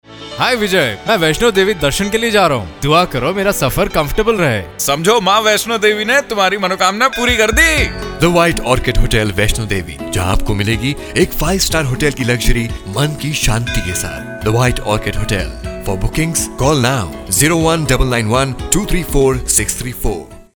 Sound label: Hotel Jingle; White Orchid Hotel